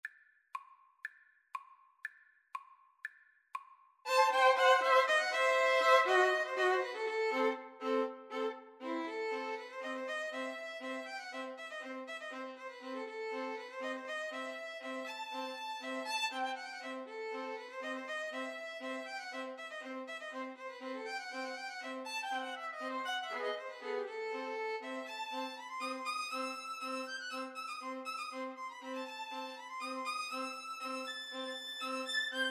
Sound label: freilechs von der chuppe, 2 violins viola